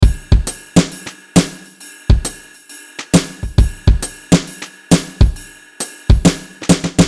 140 BPM, Acoustic Drum; Unison Acoustic Drum Pack

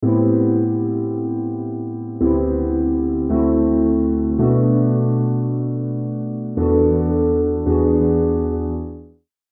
Keys, One-Shot; MIDI; Unison One-Shot MIDI Essentials

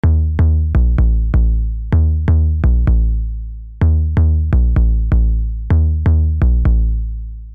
Bass Loop; Malaa

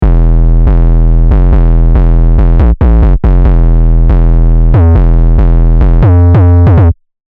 Bassline Em 140bpm; MIDI; Unison MIDI Starter Pack

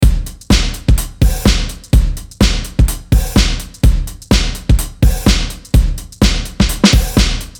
Swing 126 BPM, Acoustic Drum; Unison Acoustic Drum Pack

